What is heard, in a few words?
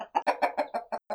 Idle, Chimken; TheStable